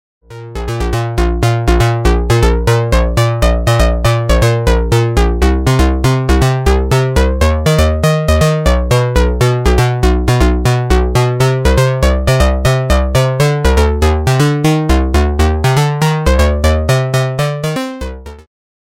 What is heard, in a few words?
waldorf pulse